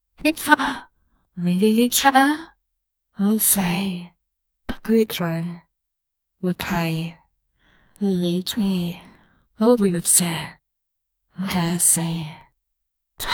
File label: casual game comics retro; cartoons